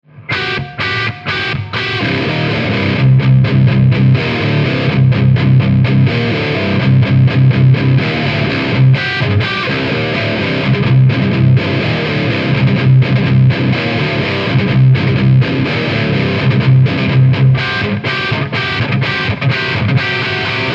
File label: X-DRIVE MODERN; xgear